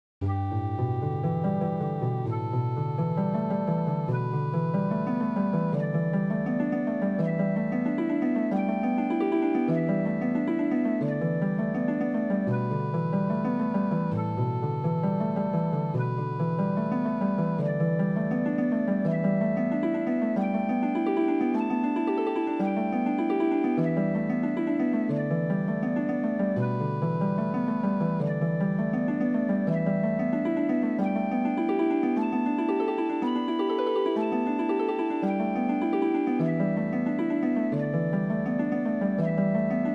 shakuhachi with sea waves

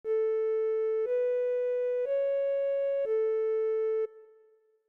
recorder

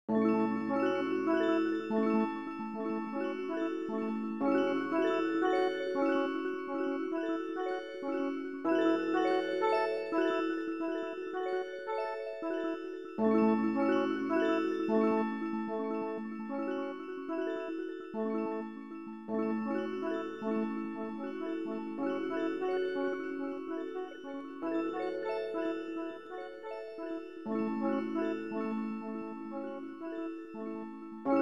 echo effects in rests

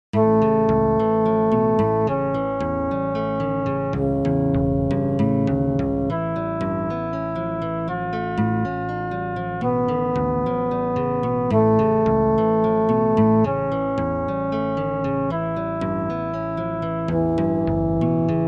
amble thirteen limit harmonic fragment; fractal music; Sloth canons

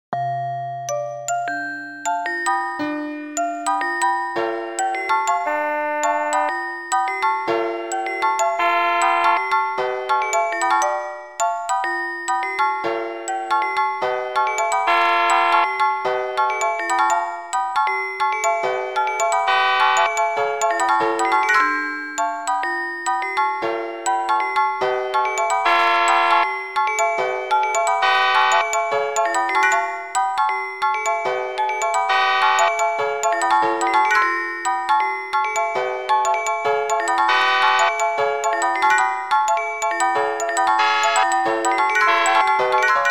timbre experiment

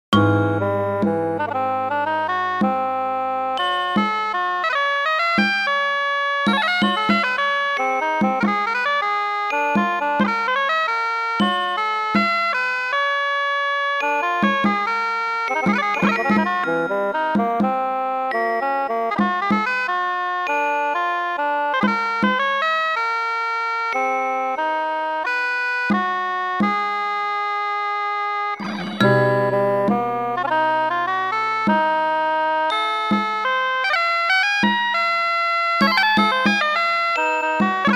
paleolithic field recording, fractal music; Multiple seeds

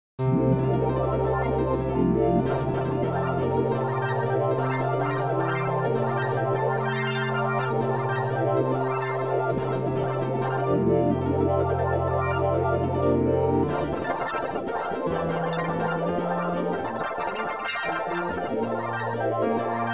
arabic 17 tone maqam ussaq; fractal music; Sloth canons

